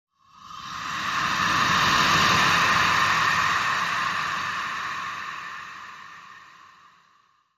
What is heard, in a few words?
Transition Breathy Swell